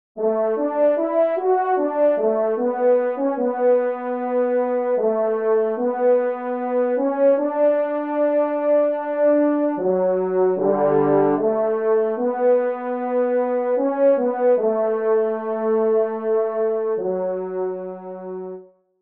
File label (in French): Trompe seule; Romance; Saint Saens; Teknia